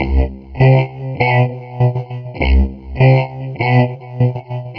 synth 100 evil C B; Transmission-X

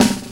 DANCE SET, DRUMS

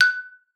F#5, xylophone; Xsample VOL-4

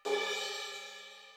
ride, Millenium MPS-400

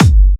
Electro Kicks

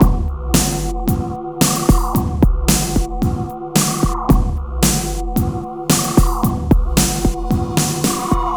Darkwave, Processed Percussion

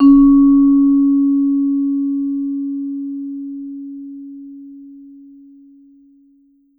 Vibes, C#3; Sampleheads - Dave Samuels Marimba & Vibes

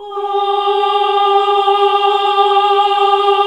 "Ohh" G#2, classic vox

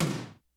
HOUSE KIT, DRUMS; Kid Nepro; Elite

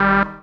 RAVE, RAVESYN

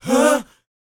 BOYZ A#, Vocal Planet R&B & Dance; ILIO

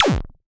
Kick, Cwejman S1; Mark Kick; DevSnd Cwejman S1 - RD

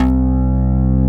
SYNTH UMB; AMG - Global Trance Mission VOL-2

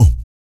Blues Soul; BOOGALOO; Spectrasonics; Vocal Planet

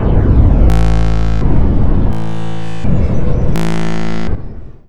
PLASMA, MACHINES

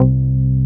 SHAKY C2, BASSES

